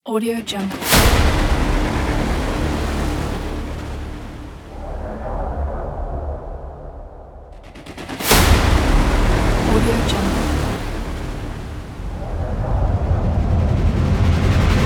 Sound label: intro ident